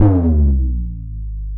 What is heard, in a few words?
BASS R, Drum & Bass 160 BPM